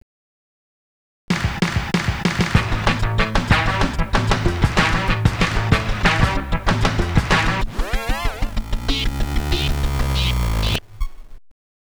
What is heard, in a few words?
jingle